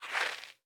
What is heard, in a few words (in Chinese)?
细雪踩踏声